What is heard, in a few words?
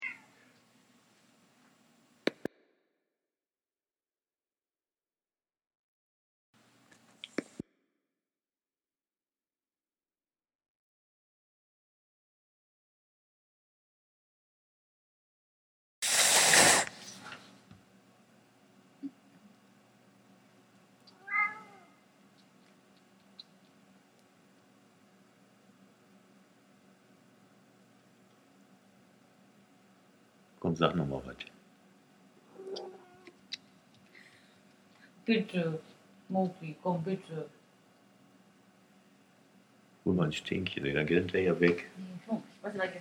Miau; mogli